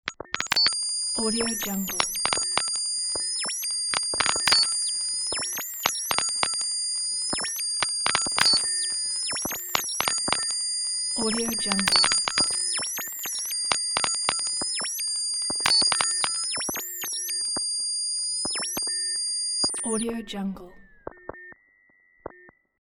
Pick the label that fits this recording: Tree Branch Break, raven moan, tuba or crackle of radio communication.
crackle of radio communication